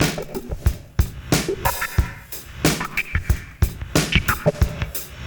Beat Loops; Zero-G; Monster Beats

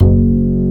UPRIT BS F#1, WOOD BASS

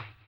HAMBONE; Blues & Soul

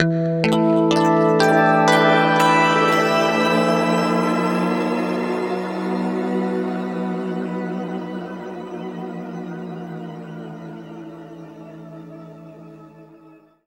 harmonic, guitar; East West; Steve Stevens Guitar Samples Collection

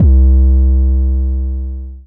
C Bass

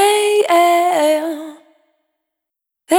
Delos, Vocal Samples, Single Voice Melodic Loops; Singles

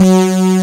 SYNTHESIZER ANA LEAD

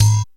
Udu, dry perc, Quasimidi Rave-o-lution 309

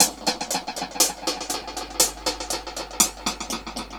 Dub Drums B Echo Hats 120bpm; musicradar

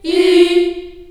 F3, Choir, CHILD; CHILD IHS

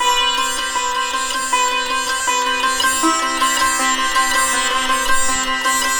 SITAR, Indian Traditions

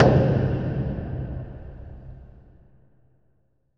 WATER TANK, Impacts, Hits & Booms; Distored Reality 1&2

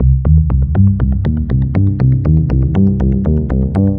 Delay Bass; Sonic Foundry (Sony Creative Software); The Electronic Music Manuscript Collection; Bass Foundations

